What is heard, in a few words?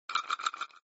dice roll